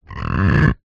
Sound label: drinking glass rub squeak creak finger; foley